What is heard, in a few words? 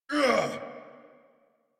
Pain; New War; Kahl